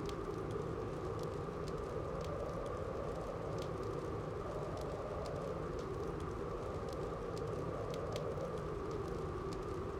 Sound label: ambi endless new lobby